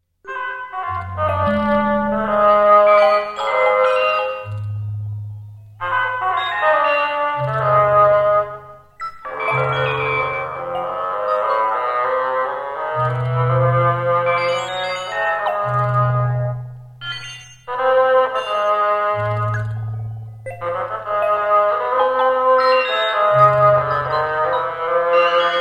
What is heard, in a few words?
Fantasy for Bassoon and Moog Synthesizer